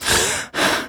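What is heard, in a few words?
Realistic Fatigue Voice, female; oblivion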